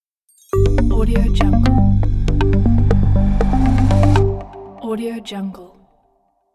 positive sketch logo; mikekoylo

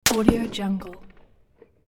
single gun shot with left shot and right impact; hollywoodedge

